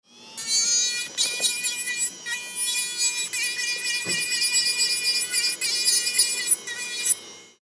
dental drilling

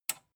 metal button tiny release disable

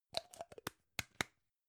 gum plastic box close

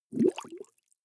bubbles soft

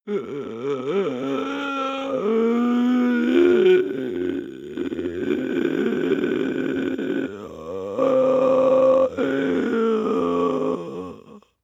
breath male inhale whine

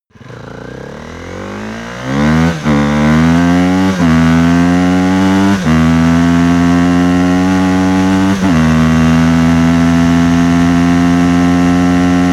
motorcycle sport bike accelerate decelerate

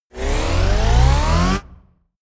wind up

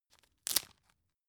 tissue open

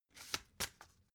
playing cards shuffle single

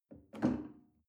chair wood take medium

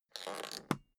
suitcase close latch lock

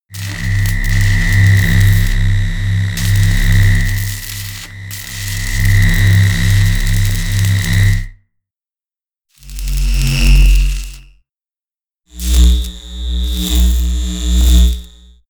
buzz

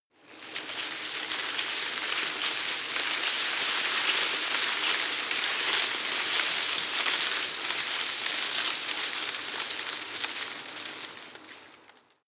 tree small leaves rustle medium

